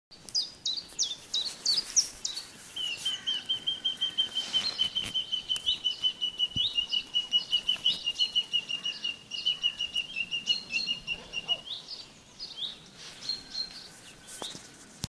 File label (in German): moenchsgrasmuecke